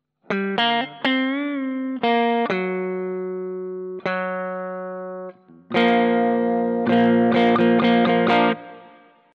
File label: bridge hb neck hb